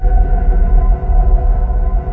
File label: SPATMOS; East West - Global Sounds; SPATIAL